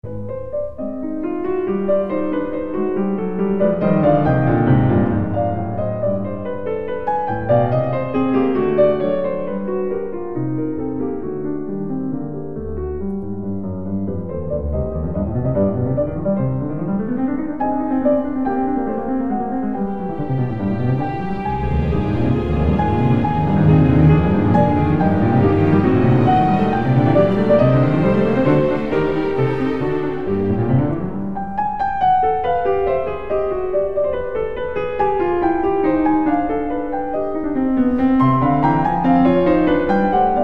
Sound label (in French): concerto pour piano, allegretto; MOZART